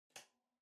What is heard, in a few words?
Electric Fan (Far-Switch-Swivel)